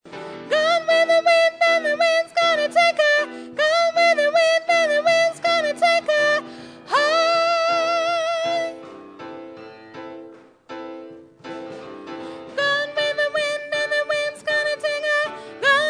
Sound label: tenor